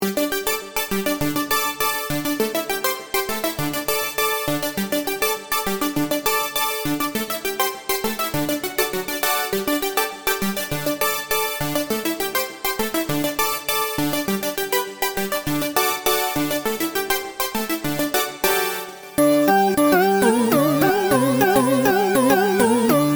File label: Silex Synth